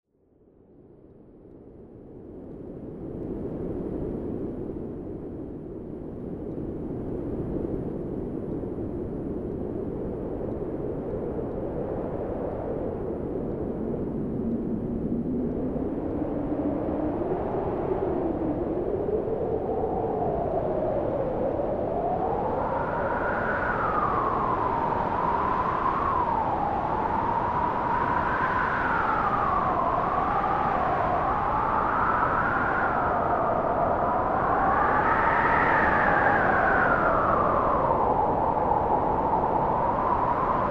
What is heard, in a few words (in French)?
silence du vent